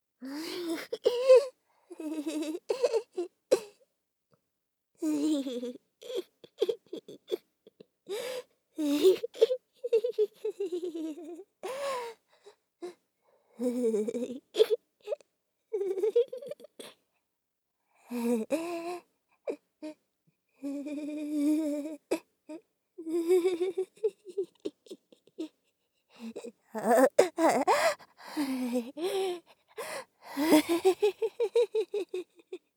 中毒; 効果音なし

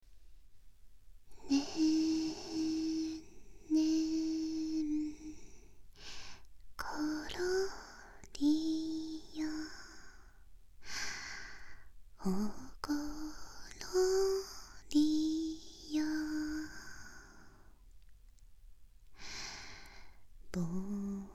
子守唄単体

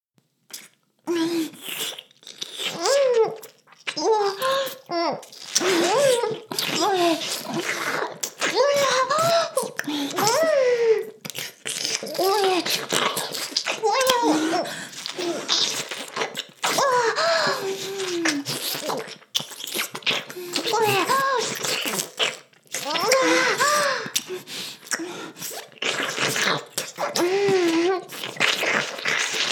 フェラ, ASMR; 淫妖精の森

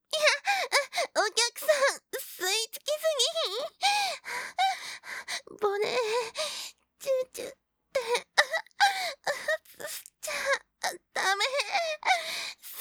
喘ぎ 連結版

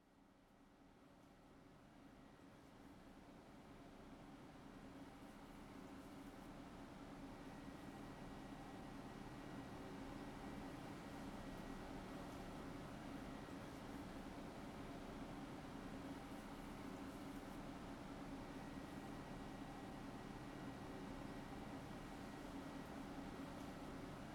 オイル指圧+右耳かき。竹耳かき+小指梵天

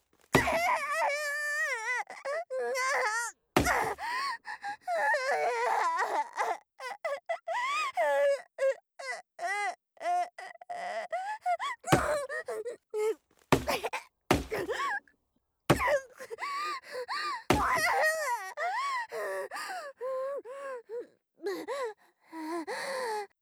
暴力

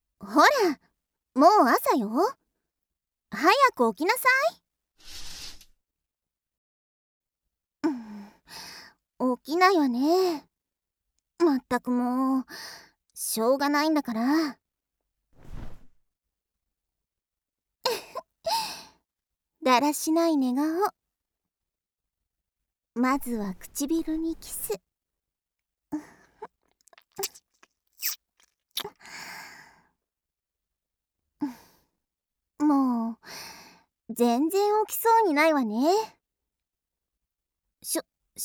おはよう愛情フェラチオ

